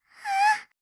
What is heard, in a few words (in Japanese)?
komaru, breath